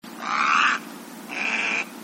kosagi

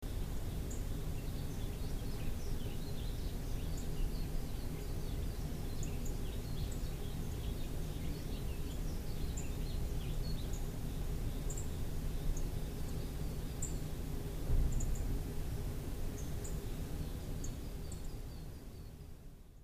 miyamaho

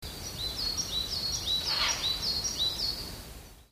fukuro

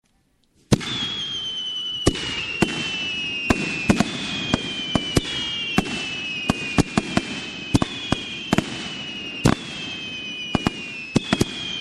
hanabi

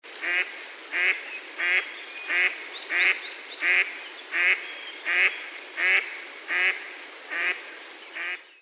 karugam

